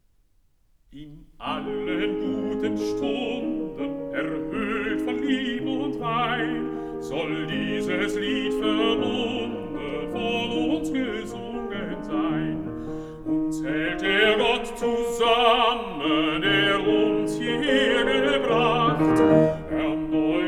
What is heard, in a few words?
song for voice & piano; Bundeslied (＂In allen guten Stunden＂); D． 258; Schubert; Lieder